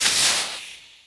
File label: Sizzle Laser Hit Water; SFX Kit; Creaks, Scrapes, & Misc